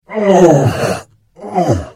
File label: Hit; The Elder Scrolls III - Morrowind